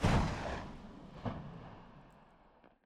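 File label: nagant revolver; weapons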